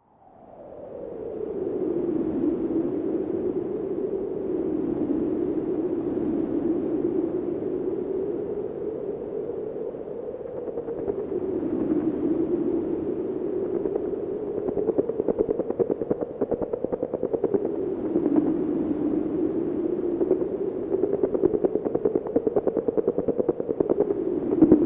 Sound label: fx sound; ms20m